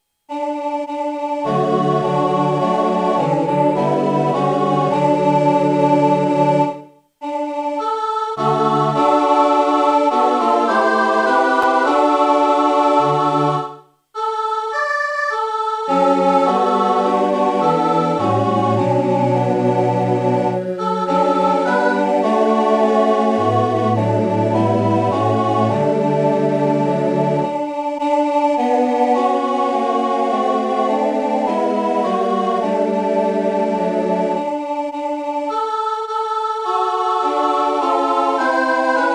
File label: tutti